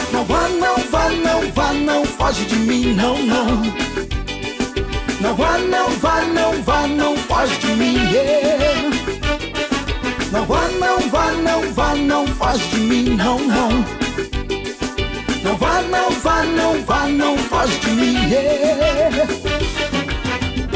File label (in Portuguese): toque para celular